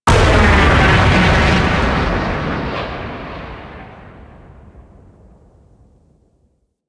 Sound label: Stanchion Ship Missile Launch; Warframe; Vehicles; RailJack; Proc Ships; Corpus